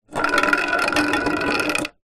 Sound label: tin small metal empty roll wood surface